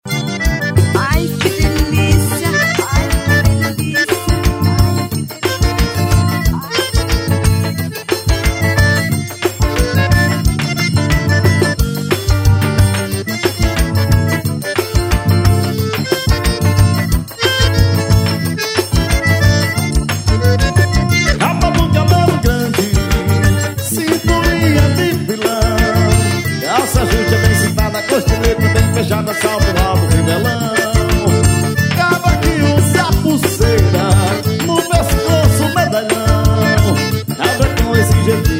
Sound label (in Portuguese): xote